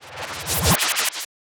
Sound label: stylized glitch sound; soundscrate